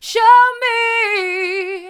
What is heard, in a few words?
hard, vocal; show me